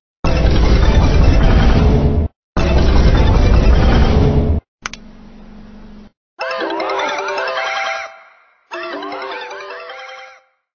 sony ericsson

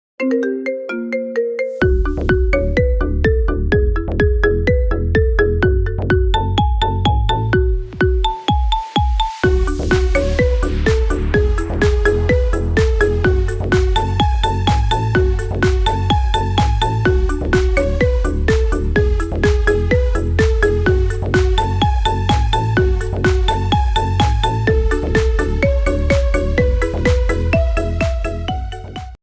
Marimba Remix

